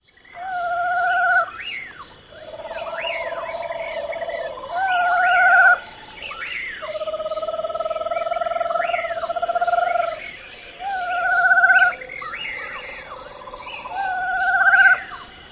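Eastern Screech-Owl whinnies and trills with pauraques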